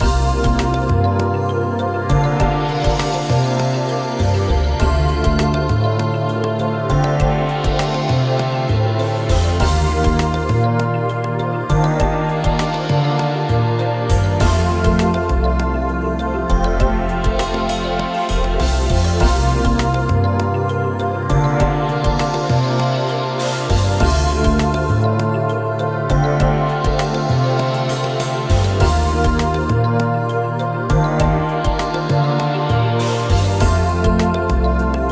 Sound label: Loop Ambient